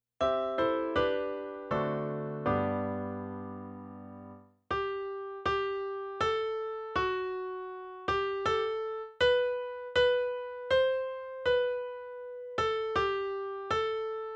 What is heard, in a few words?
in G Major